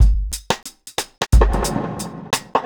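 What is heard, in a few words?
reggae drum FX; Best Service Advanced Media Trax Modern Composer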